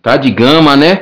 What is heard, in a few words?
berimbau